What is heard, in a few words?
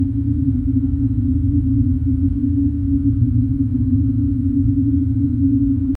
cold ambience with drone